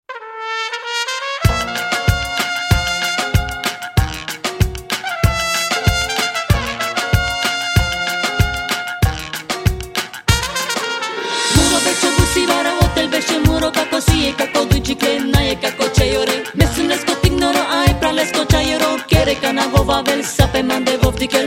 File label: Ding Deng Dong, banda